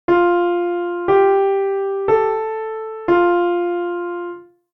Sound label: Easy Melody